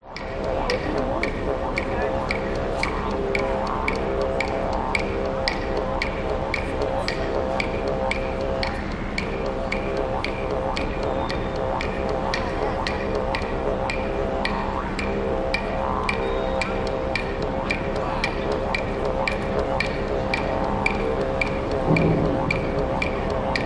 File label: public places city tourists street performers didgeridoo percussion aboriginal; close people